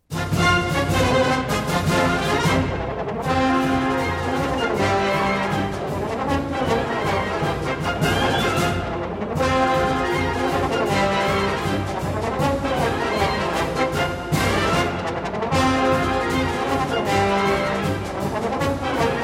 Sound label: Wind Ensembles; Fillmore; Rolling Thunder; Eastman Wind Ensemble; Screamers